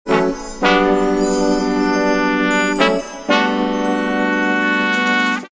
Jazz Signature, ringtones; TCL S300T

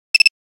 6210 Cicada, Nokia Buzzer; Windows Phone apps

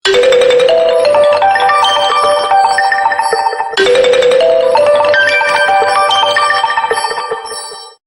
Soft Beems of Sunlight, Ringtones Rhythm Mix

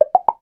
Bubble, notifications; Coolpad; Note-8

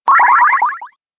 call disconnect

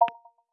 ui information note

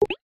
Lock; Gome; U7

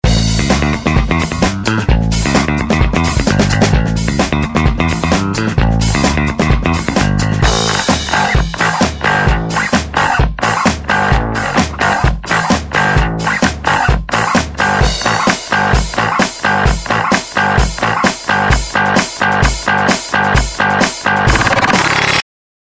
Bassists' Battle; KTF; EV-W350

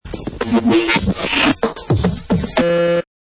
Alert Distortion; Motorola V180; MTV Distortion

